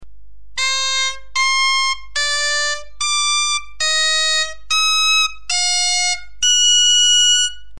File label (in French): exercice octave, bombarde; Montée progressive